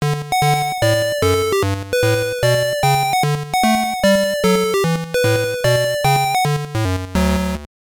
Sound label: Unknown Jingle; Hatris; GB